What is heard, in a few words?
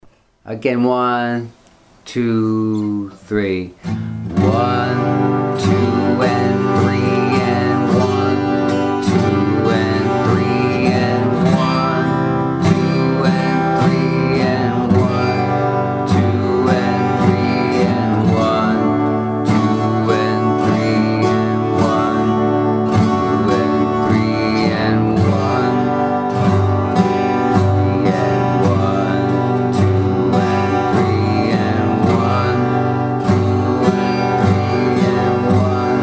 practicing 3 4 strum